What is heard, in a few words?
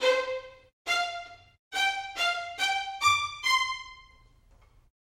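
violin spic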